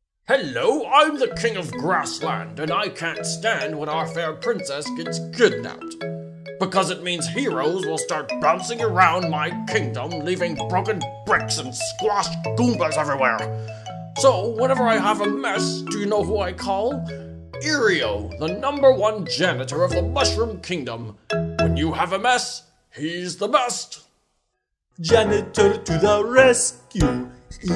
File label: Jingle; Eario